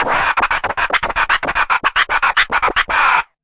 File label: train, Scratching and Turntablism; DRUM LOOPS and BREAKS